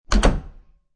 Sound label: Door Close Wooden Door, Household, Door Close, Wooden Door; Miscellaneous Door; Doors